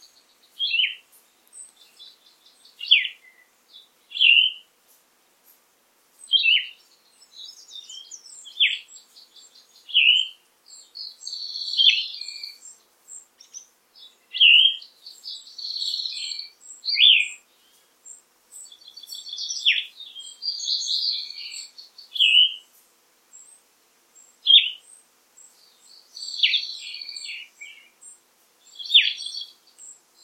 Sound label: Plumbeous Vireo, Vireo plumbeus; vireos